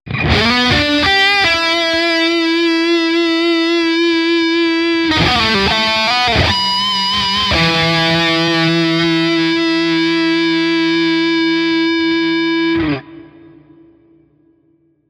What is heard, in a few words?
Lead Sound with Reverb Les Paul